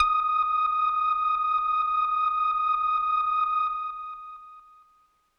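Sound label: Leslie Kawaii D#5, organ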